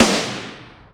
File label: prarie snare; Greytsounds; Scott Peers Sound Engineering